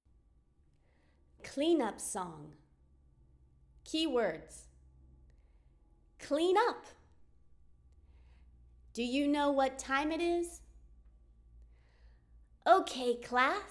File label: Clean-up Song English; Rituals